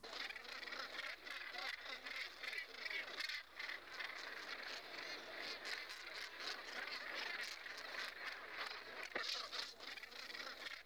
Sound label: northern gannet